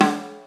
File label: Snare Smooth Cymbals Snares